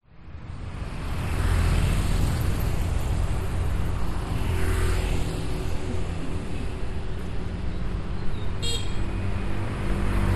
public places urban street bali indonesia outside kerobokan prison traffic people